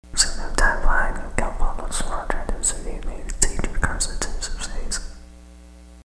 whisper; svencoop

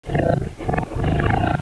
communicate; svencoop; sexr

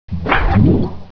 gas pickup, tank

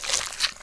web spin; weapons

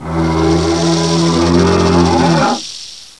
geneworm attack mounted rocket; svencoop

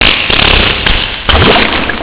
farty

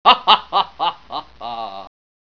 bandit laugh; gearbox

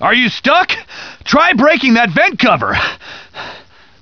movement; gearbox; gunman

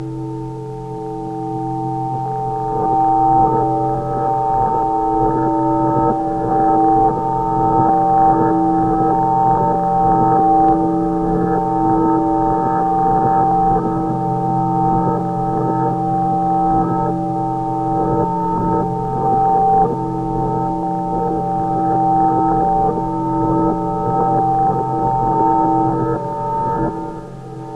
fracture loop; svencoop

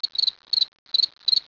cricket idle; gearbox

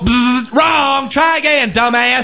bzz wrong; firearms

